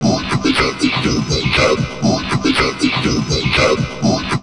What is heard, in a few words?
VOCOD, Drum Loops Crazy Processed